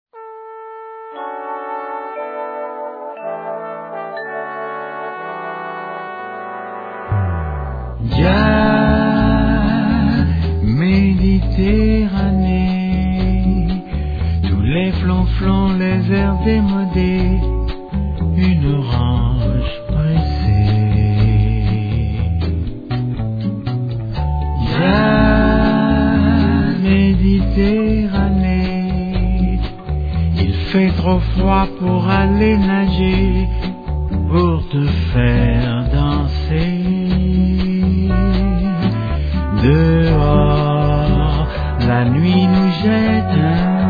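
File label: Jazz Méditerranée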